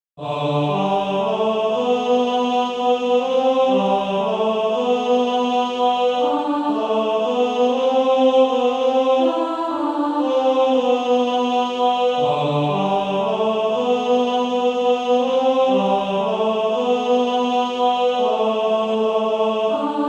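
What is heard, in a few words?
Male Choir, Practise Music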